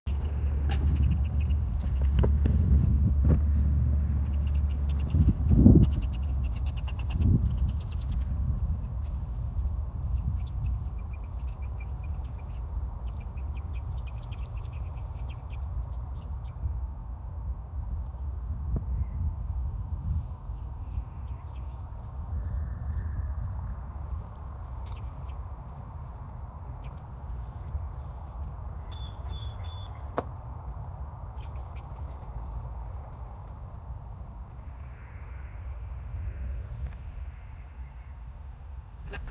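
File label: duck noises with no background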